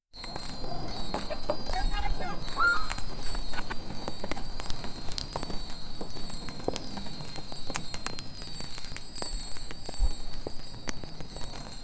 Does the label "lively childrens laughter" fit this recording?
Yes